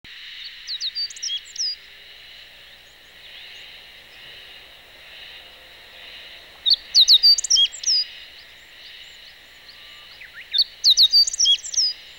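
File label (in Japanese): ホオジロ, 野鳥の鳴き声; 筑紫小野鳥観察ｸﾗﾌﾞ支援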